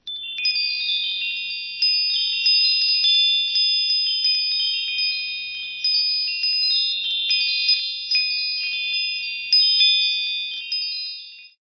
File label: woodstock chime gregorian little